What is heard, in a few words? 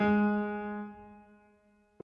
Piano Chord